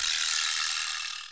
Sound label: vibraslap